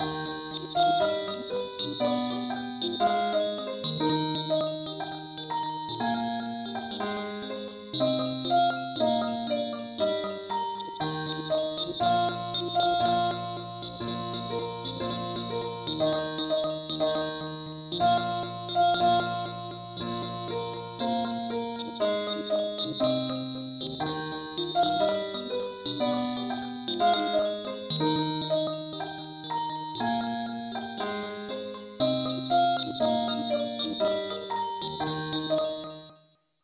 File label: mandolin key ees